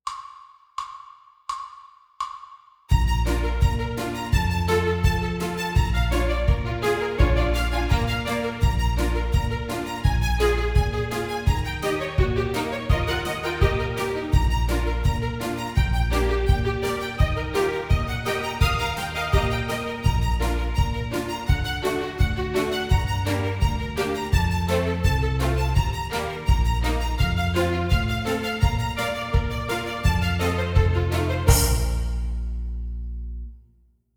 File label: Low Interval Study